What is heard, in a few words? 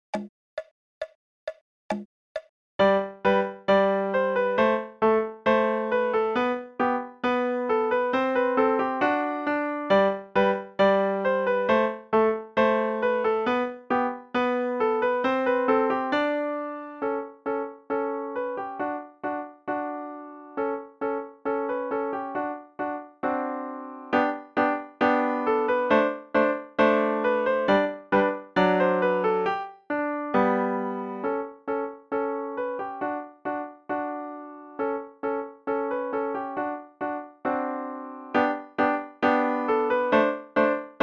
Deben Gavotte